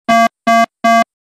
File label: beeping